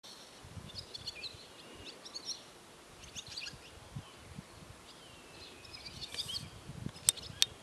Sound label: American Oystercatcher, Haematopus palliatus; Aves